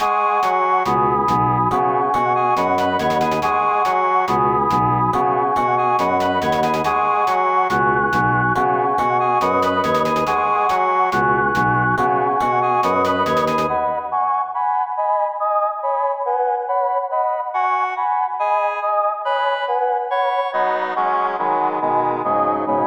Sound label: forest nymphs, music; 2020 eclectic music pack